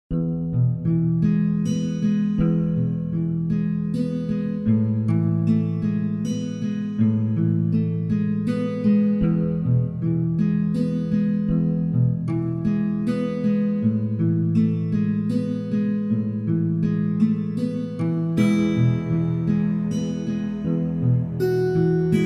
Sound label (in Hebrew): מוזיקה מדיטטיבית